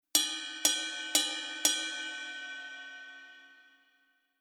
Drum Set Basic Ride Cup

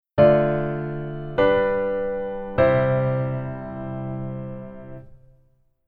Keyboard Voicing Hi

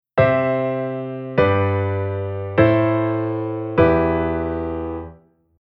Chord Inversion C G Am Em

